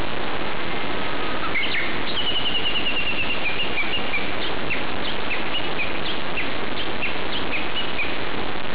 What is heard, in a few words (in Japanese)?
uguisu